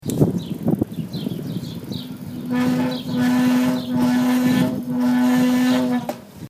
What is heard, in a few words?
brake